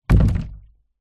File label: industrial cannister small plastic liquid down concrete